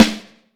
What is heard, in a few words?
5X14 Snare Drum; Q Up Arts - Johnny.C's Vintage Snare Drum